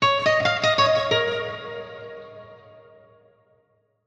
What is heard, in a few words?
Stinger Guitar; World of Warcraft; Chains of Domination; Tazavesh Stinger Guitar 7